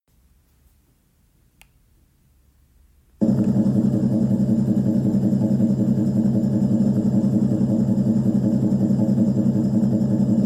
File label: Fan Sounds; Dreamegg D3 Pro